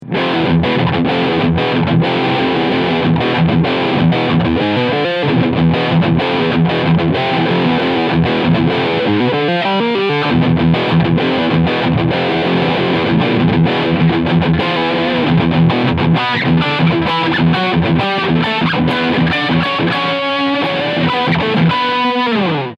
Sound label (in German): Ibanez RGT1221PB DTF Riff Steg PU